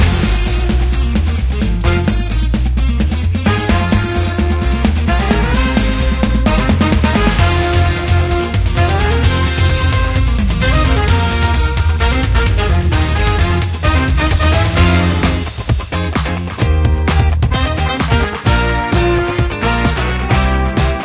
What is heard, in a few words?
music fight loop; naruto ultimate storm android ios gamerip 2016; kh music Summer fight loop